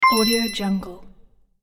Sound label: puzzle game echo chime